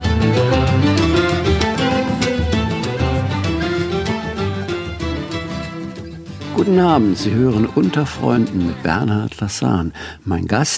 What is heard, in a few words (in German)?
Jazz; Polizei